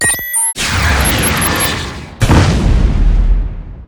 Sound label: Start up machine